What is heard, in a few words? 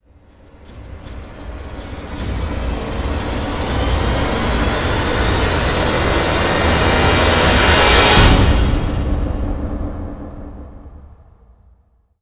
STRING SWELL EXTENDED; transformers war for cybertron gamerip